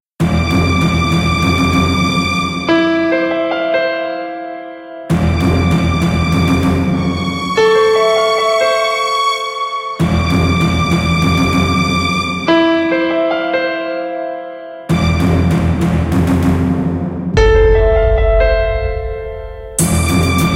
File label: Medium zawa Instrumental, Suspense